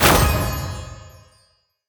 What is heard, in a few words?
ui rewards unlock card Reveal